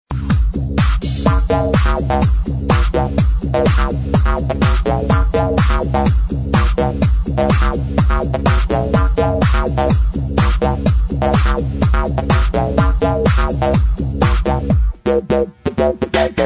electro, ringtones electronic

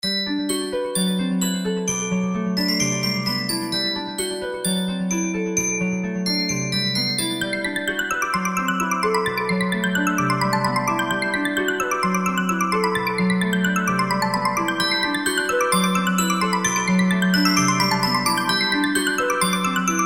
arp clock

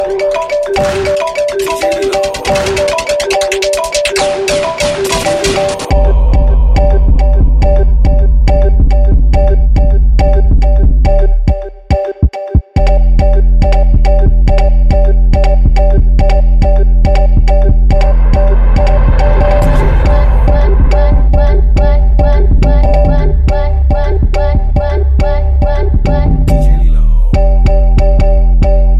IOS 6 Ringtone

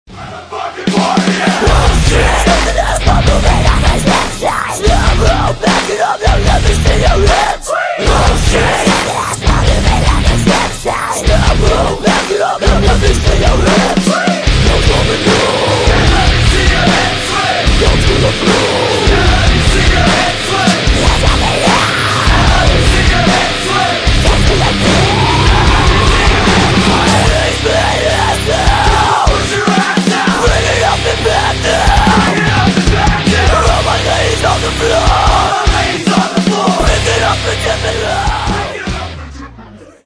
swing cover

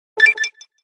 level up

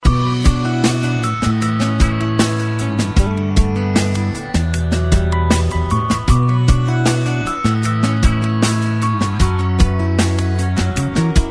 awesome romantic

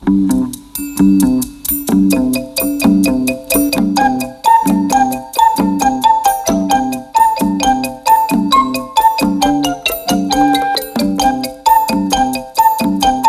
Danish kids intro

